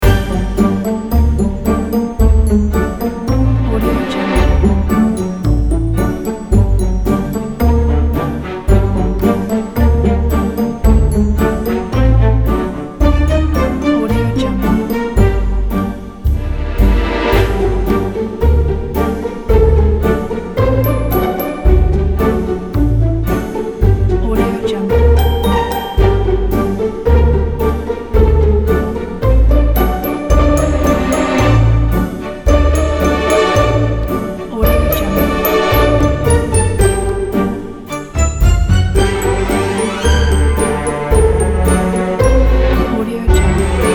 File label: classic casual background